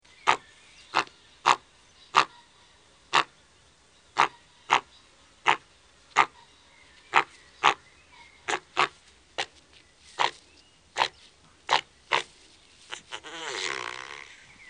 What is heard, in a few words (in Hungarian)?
Tъzok; Madarak